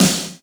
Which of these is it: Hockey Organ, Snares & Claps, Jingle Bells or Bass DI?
Snares & Claps